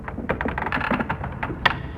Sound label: push wooden door; We Were Here Forever